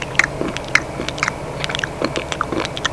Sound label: slurping